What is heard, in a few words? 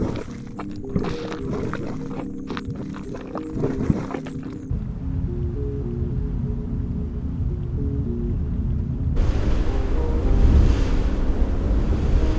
3D Surround Ambiences Geothermal; BOOM Library